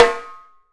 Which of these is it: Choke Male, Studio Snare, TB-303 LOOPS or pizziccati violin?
Studio Snare